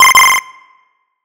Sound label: heartbeat